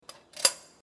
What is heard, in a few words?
Poking Letter Box Once